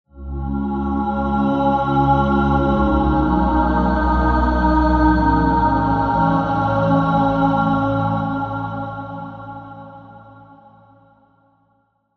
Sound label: Pad; Routed Sound; Spire Expansion - Flame Summoner Vol.1